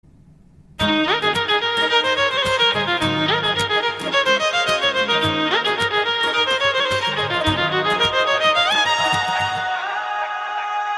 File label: Instrumental